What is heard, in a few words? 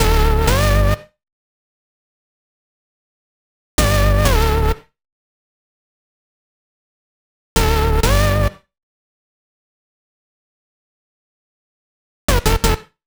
128 Dm Bass, Complex Electro Synths; Prime Loops; Deus